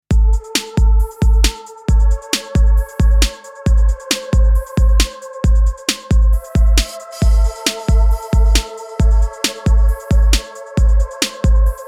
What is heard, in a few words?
sound synthesis microbiom